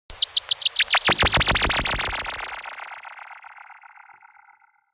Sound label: general ufo twistings